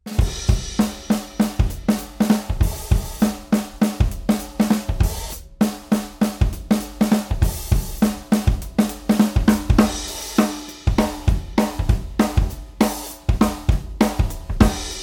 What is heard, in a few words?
Hoops groove, snaredrum; snaredrum spannreifen; snaredrum spannreifen vergleichstest